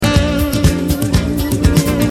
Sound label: Dim Cmaj7